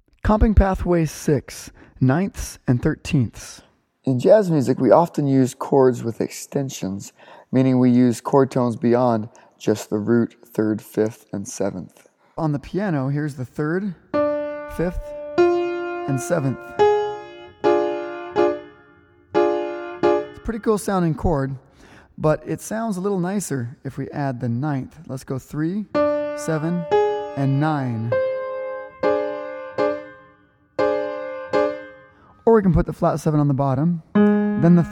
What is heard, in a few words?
comping 9ths and 13ths